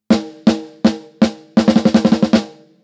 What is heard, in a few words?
LOW post High pass 36dB oct; Kelvin